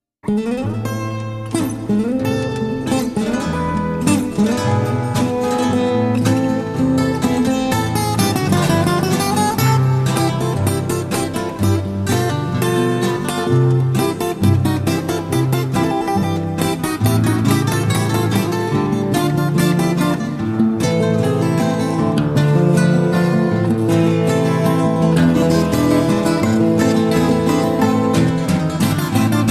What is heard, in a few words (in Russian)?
Ciganskaya gitara